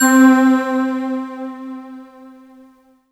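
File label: Yamaha TG100 New Age Pad C4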